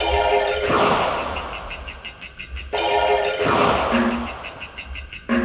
Airy SFX; Sonic Foundry (Sony Creative Software) - Rondo Brothers Trip-Hop Loops & Samples; El Corazon

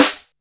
Snare, Boss DR-660, Drum Machines

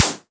SD, Waldorf Blofeld

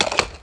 HORSE GALLOP; AKAI S-Series CD-ROM Sound Library VOL-8; SFX

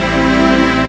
DR. LOOP; X-Static Goldmine 3

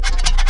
SCRATCH; E-MU Formula 4000 Series Vol. 2

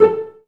CL1 PIZZ A3; Best Service; Peter Siedlaczek's Advanced Orchestra

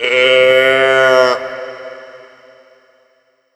COW TOYS, Ragga & Dub Reggae; USB Soundscan vol.27

